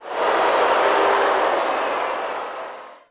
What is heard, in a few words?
Boo, Crowd, reactions